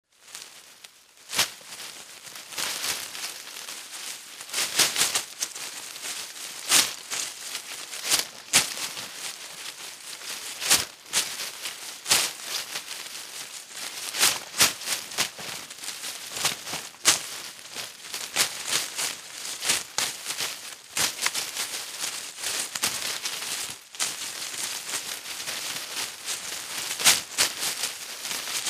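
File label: rustling in the grass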